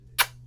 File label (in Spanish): Besito